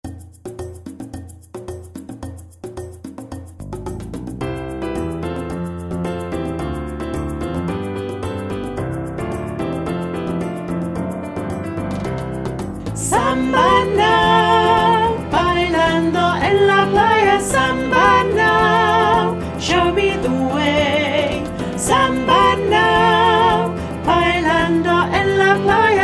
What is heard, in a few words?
Seaside Samba; year 3 big sing